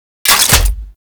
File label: Shotgun Mech; Veilbreaker; Kahl